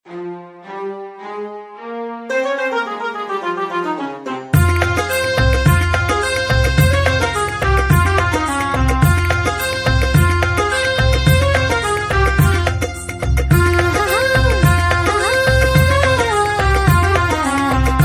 Starting Musik Tone

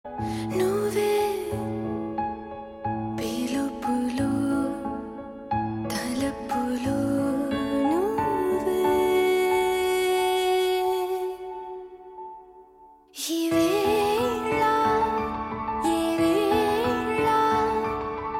Ringtone

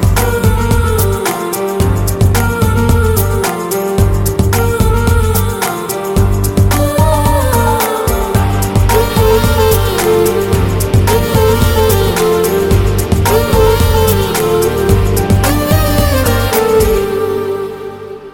Solo BGM

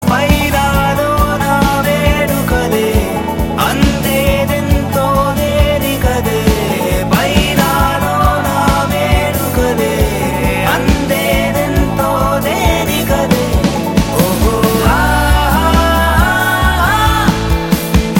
Ringtone

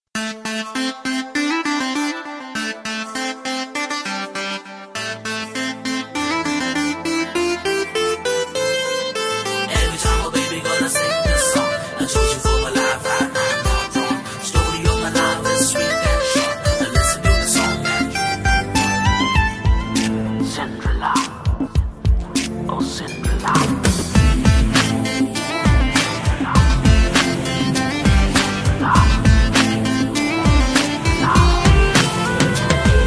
Instrumental Tone

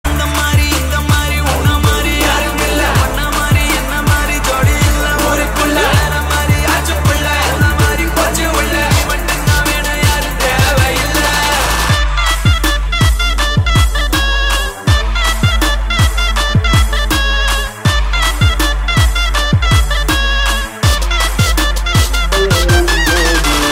Paisa Note Ringtone

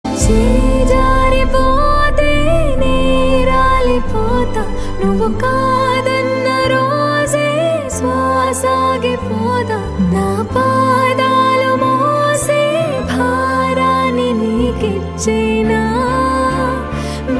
Female Ringtone

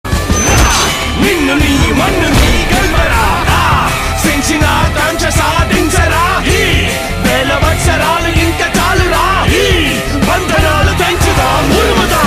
Theme Ringtone

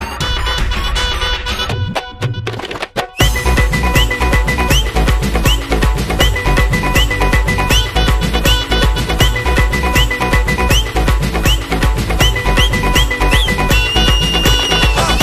Chill Bro Bgm

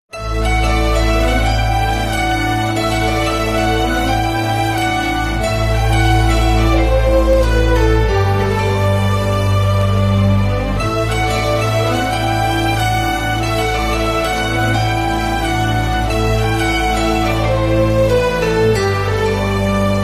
Prelude BG Ringtone

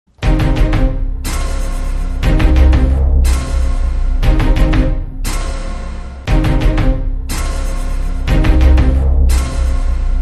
Tamil BGM; NOTA

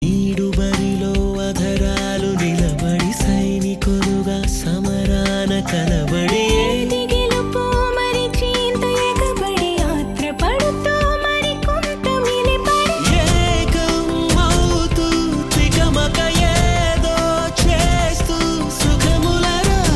Male Ringtone

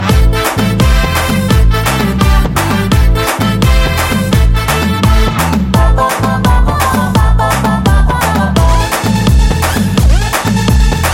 Instrumental Tone

